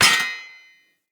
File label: Long Sword Impact Block Defender